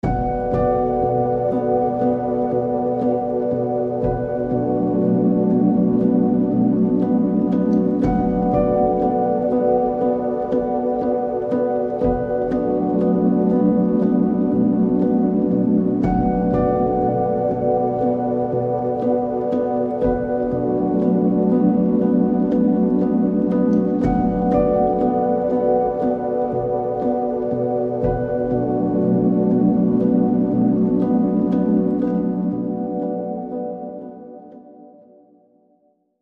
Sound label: Light Piano Pulse